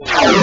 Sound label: ufo gun